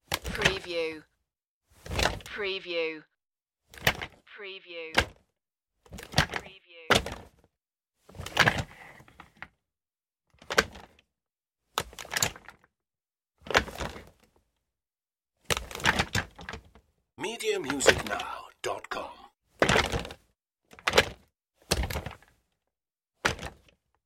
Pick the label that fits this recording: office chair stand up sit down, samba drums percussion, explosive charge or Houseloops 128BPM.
office chair stand up sit down